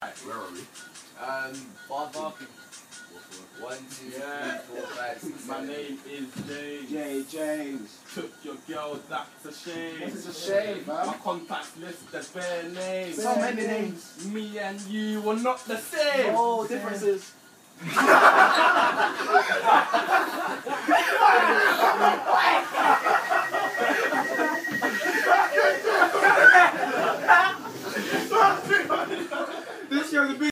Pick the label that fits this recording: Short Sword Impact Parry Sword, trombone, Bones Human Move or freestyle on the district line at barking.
freestyle on the district line at barking